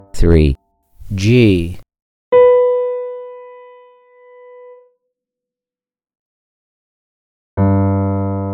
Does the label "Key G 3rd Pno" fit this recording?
Yes